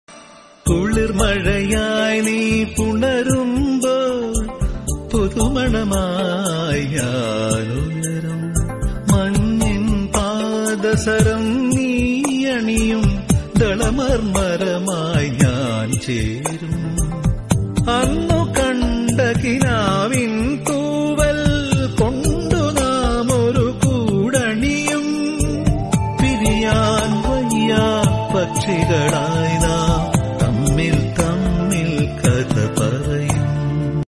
malayalam romantic song

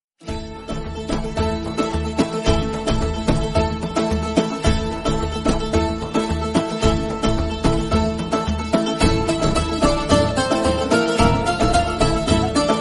song bgm theme; ertugul gazi; the rise of nation

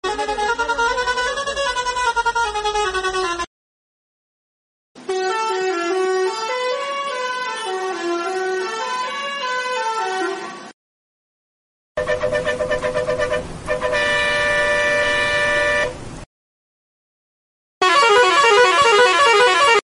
bus simulator background music; bus simulator indonesia

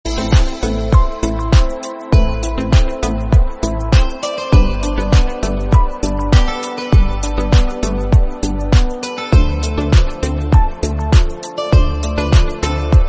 instrumental bgm

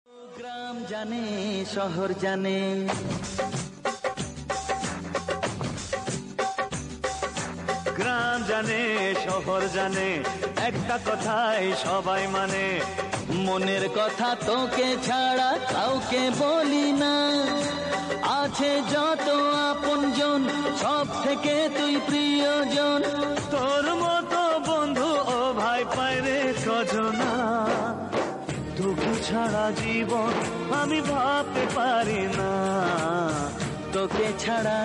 bangla romantic

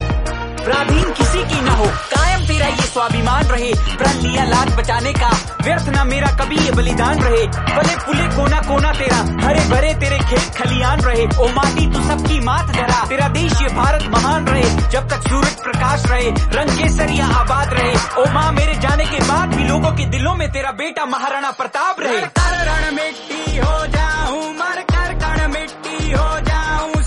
song; Maharana Pratap

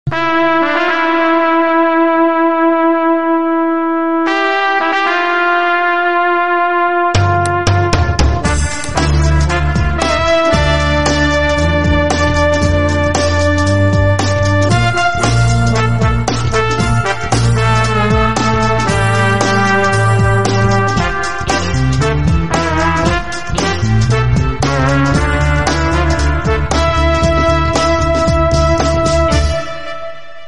instrumental; mouna ragam

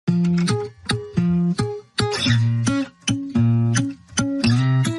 instrument song notification

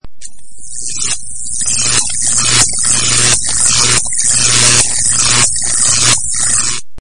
short circuit

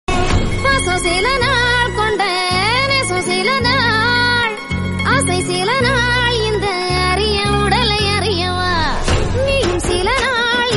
tamil song

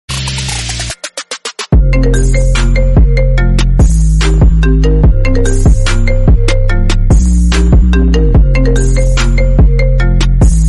iphone hiphop remix bgm

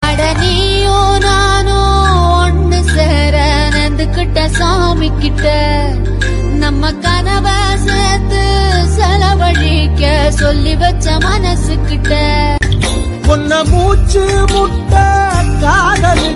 tamil song; chandi veeran

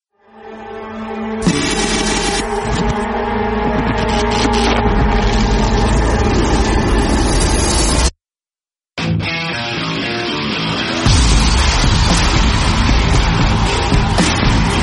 bgm

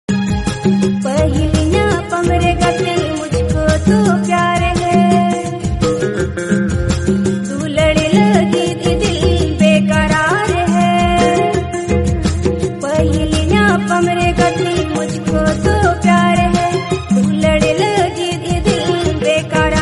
santali bhajan bhakti santali song

